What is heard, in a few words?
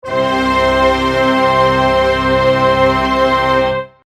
bad unison